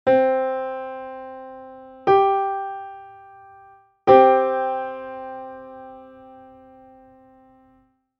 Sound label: The Hollow Fifth